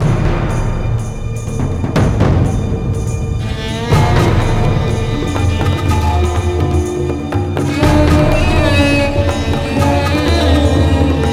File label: Low Combat